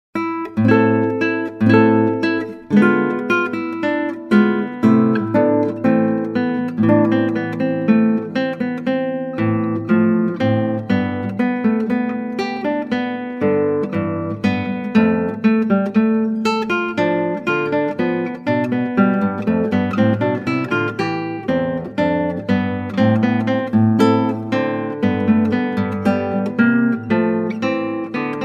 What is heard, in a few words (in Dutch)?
Klassieke Gitaar; Bach 50 Solos